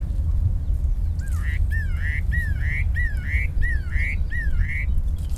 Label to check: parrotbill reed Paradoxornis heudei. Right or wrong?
Right